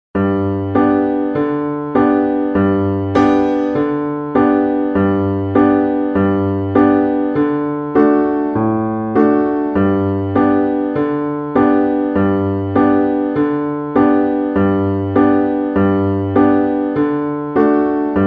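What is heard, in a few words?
Key of G Accompaniment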